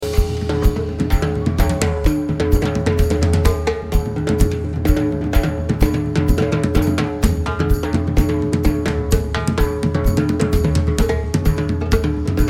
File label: Drums